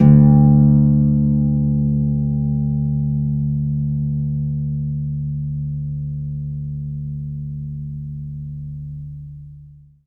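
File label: HARP E5 SUS; ILIO; Synclavier Strings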